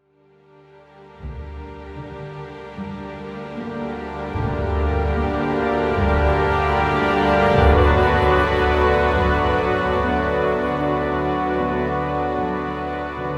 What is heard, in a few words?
Orchestral Essentials; CineSymphony LITE; recorded at the SONY Pictures Scoring Stage; Cinesamples